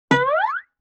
Guitar Plink Slide; Vintage Cartoon; Musical Elements

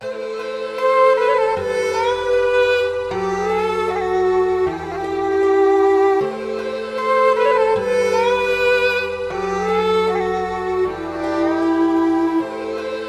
155 BPM E Min, Melody; Dubai; Cymatics; 2022 Melody Collection Platinum Expansion

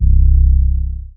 808 - C; Cymatics; Eternity Sample Pack

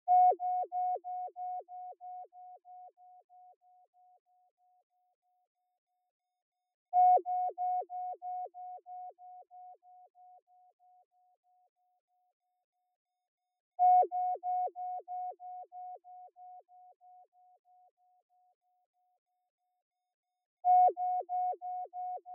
140 BPM B Min FX; Loop Stems; Cymatics; Utopia

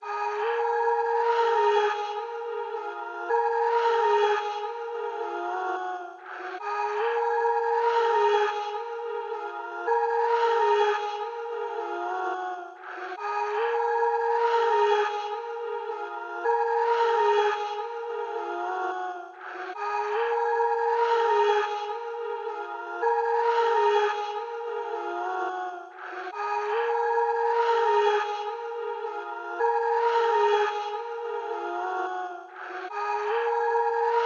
146 BPM D# Min Vocals; Trap Melody Loops; Loop Stems; Cymatics; Powers

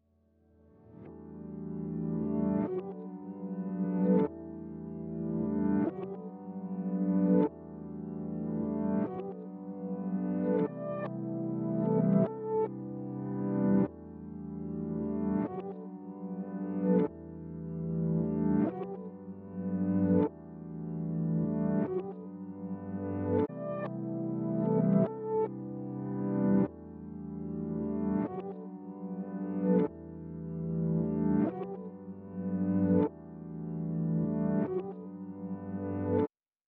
150 BPM G Maj Piano; Reverse; Loop Stems; Cymatics; 2022 Melody Collection; Wishing Well

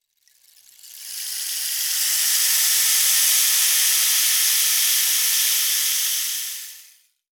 crackles, Cartoon Film Effects

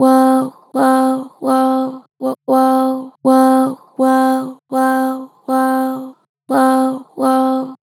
Woah, Vocals, Pitch Flat Gentle; Harmor Vocals

